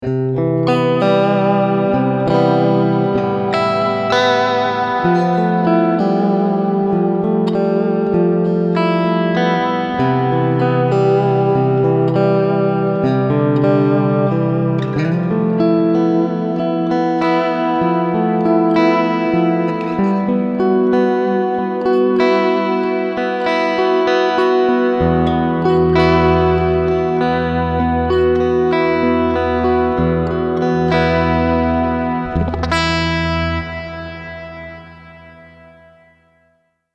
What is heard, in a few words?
LINE Church